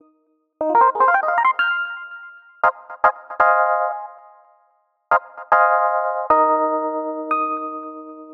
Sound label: 115 D Walkin Rhodes